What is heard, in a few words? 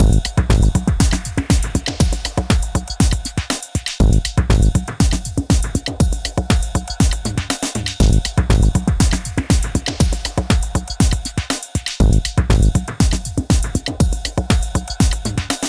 Bgm Breakbeats